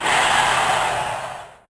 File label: Crowd